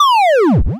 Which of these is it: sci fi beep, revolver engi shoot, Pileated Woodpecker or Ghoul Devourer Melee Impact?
sci fi beep